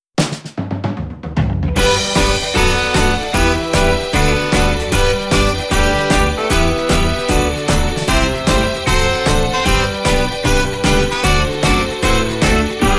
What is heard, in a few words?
Jingle Bells; yakuza xmas sides ps2 ps3 ps4 windows xbox one gamerip 2005